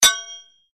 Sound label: hit sword